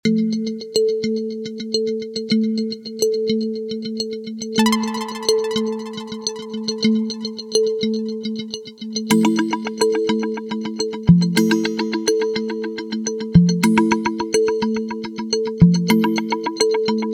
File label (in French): jingle